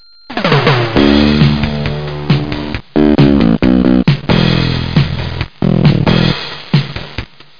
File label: ROC ROLL, MUSIC; FSSD SoundEdit Mac Sound